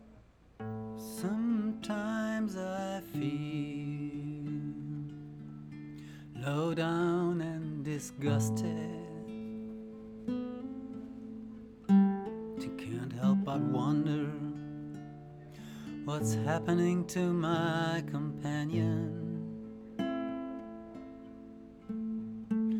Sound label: Slow Train; Casa da Paula